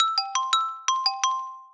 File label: xylo